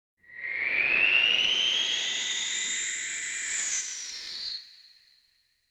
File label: cultural radio transition; motion